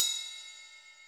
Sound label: Real Open, Hats